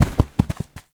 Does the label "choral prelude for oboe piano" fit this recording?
No